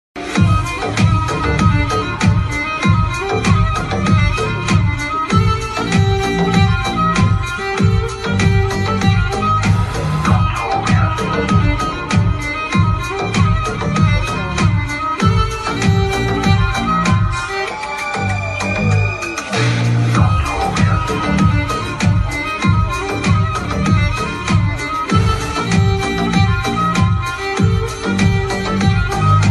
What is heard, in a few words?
bgm ringtone, south bgm ringtone; bheeshma parvam